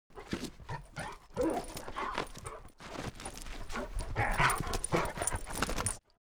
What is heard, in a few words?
labrador dog playing; BIG DOG PLAYING